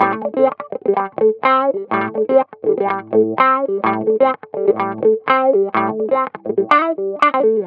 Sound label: Guitar Wah Loop, 125bpm; Zero-G; Nu Disco